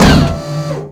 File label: abrams turrent stop; veh abrams turrent stop